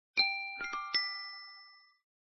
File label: Respiration